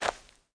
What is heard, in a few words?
spearman attack